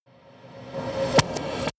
rise suck in

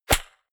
golf ball hit

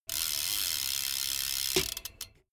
bmx bike crank roll fast freewheel brake

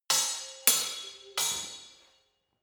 sword hit fast sword triple

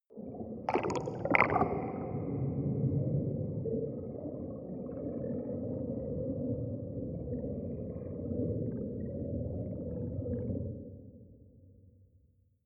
water fx

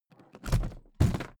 suitcase drop

